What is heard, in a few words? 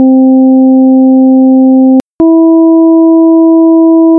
major third